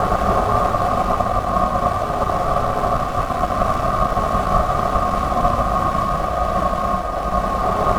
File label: Drone Ambient; Drums & Drones